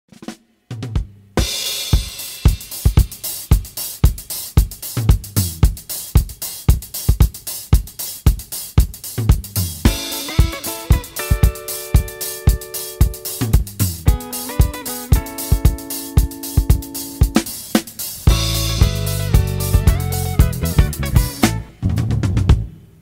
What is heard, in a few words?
Pop Band